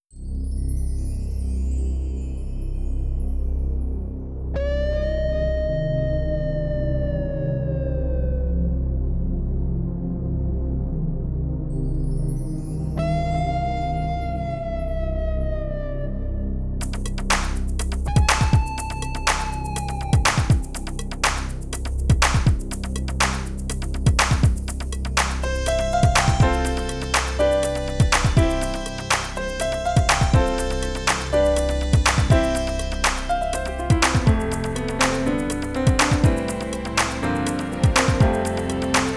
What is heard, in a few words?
drumless